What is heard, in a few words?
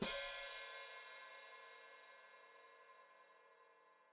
Hat Closed Open, Hi-Hat